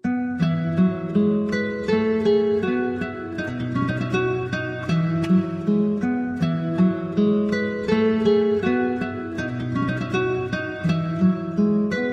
guitar ringtones

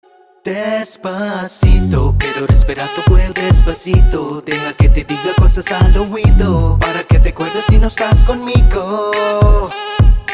beat

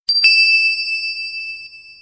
Little Bells

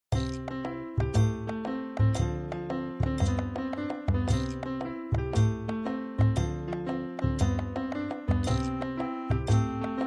Piano Duet

